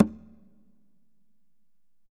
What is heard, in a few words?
Congas wet, drums percussion